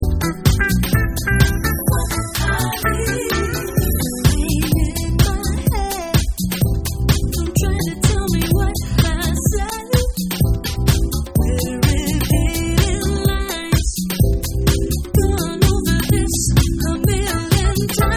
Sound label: Vocal Club Mix